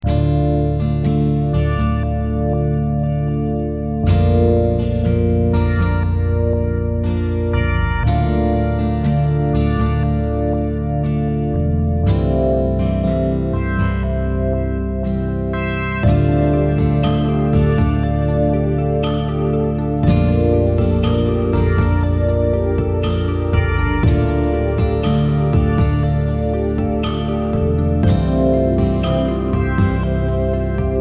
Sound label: water 120bpm; Hartwigmedia; Imagefilm